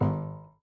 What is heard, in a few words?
Prepared Piano